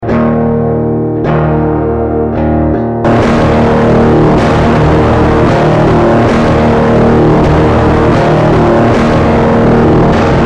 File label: Bent Guitar, Circuit Bent